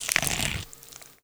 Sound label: chomp